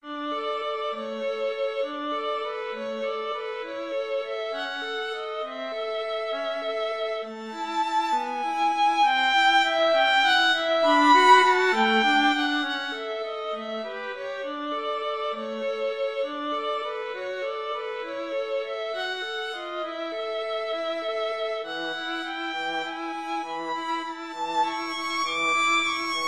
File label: violin viola duet